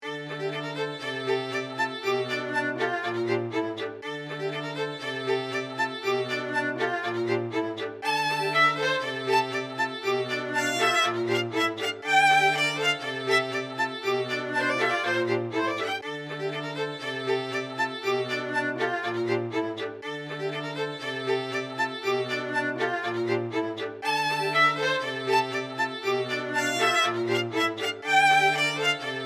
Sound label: violin ensemble